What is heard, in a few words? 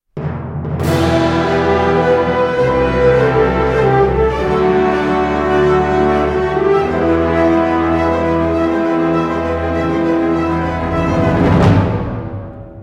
MENU STINGER win